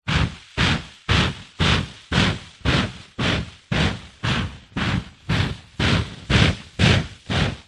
vehicles steam train steam chug designed pumping rythmic